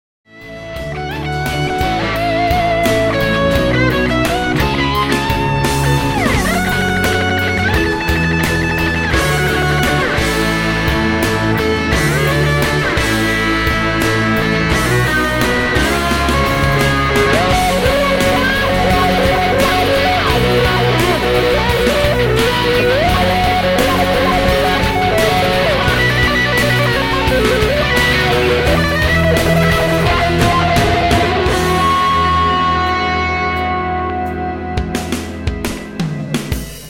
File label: preamp